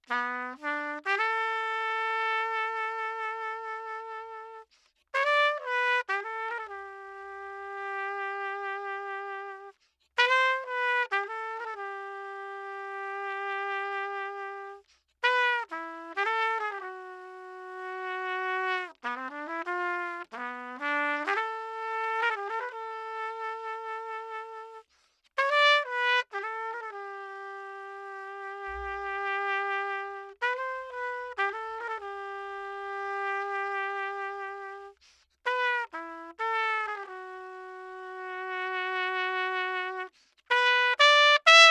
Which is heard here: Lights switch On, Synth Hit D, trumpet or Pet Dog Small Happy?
trumpet